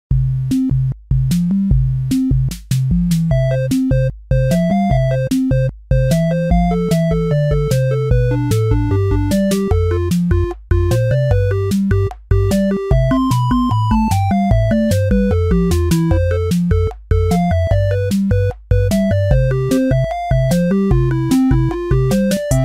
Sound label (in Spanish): Musica